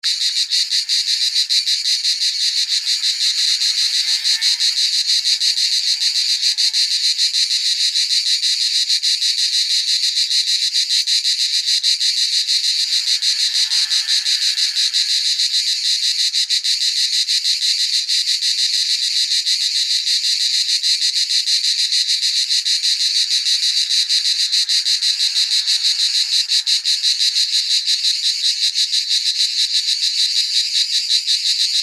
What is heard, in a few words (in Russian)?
cikady; shum prirody